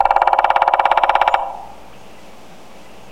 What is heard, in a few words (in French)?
pic bois